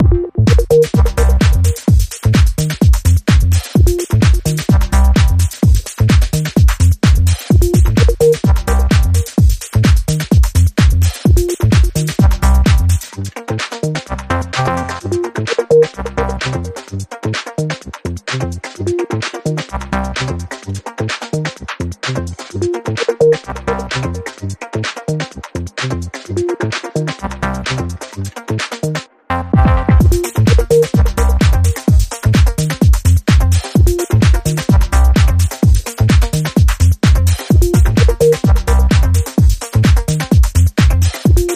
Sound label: Feel The Bass, Rhythm